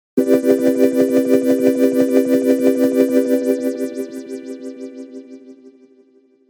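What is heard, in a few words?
Trance Pad, jup8; Calculus II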